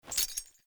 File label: Grunt Foley Melee Swing Chains; Enemy; Sinecure